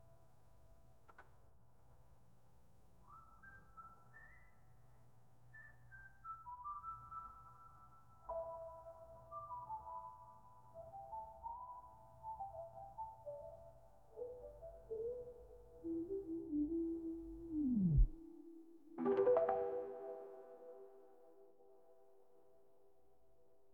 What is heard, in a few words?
interlude